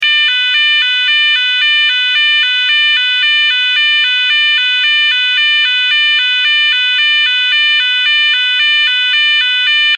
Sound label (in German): Ton